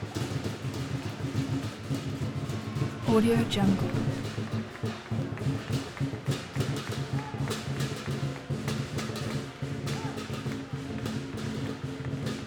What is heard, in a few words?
chinese new year celebration